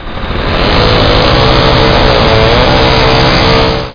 repair

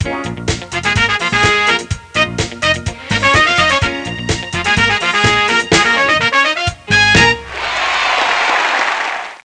Brass

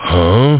Huh; Duke Nukem 3D Kill-A-Ton Collection

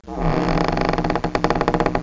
CREAK; squirt

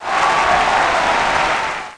cheer; JURASSIC; jigsaw

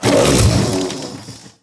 salamander hit; DAoC

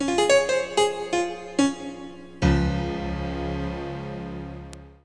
Sound ditty

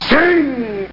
ching!

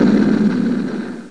Long Boom; Alpha Battle II 2.0

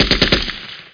ak47 burst; SPECOPS

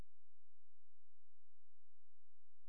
silence

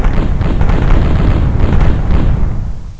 FIRE

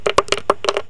Ring Click; Vrattle

